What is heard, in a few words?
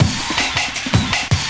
Drum & Bass, 160 BPM; Ueberschall; Drum & Bass Flash Trackz